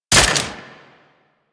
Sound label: Grineer Gorg Sniper Rifle Mech